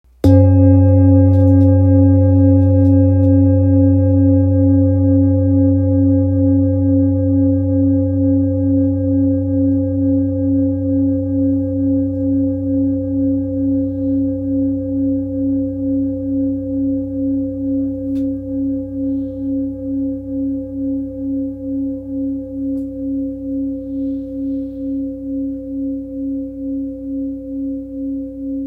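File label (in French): bol therapeute la, bols chantants tibétains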